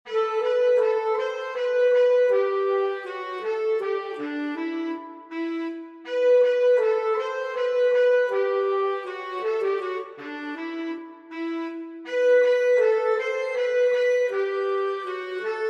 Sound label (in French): focus Clarinette; Les Valkyries